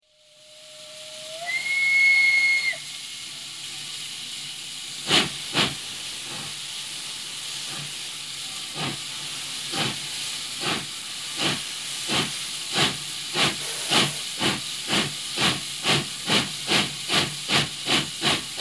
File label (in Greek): ΤΡΕΝΟ